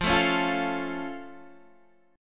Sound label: F Augmented, Guitar, Standard; strummed; 13,12,11,10,10,13; down